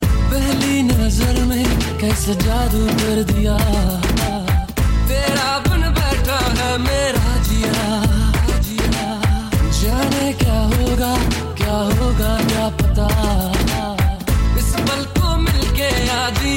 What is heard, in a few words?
Remix Ringtone